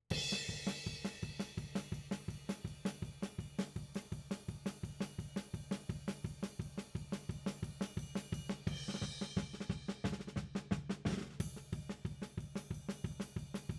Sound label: Tom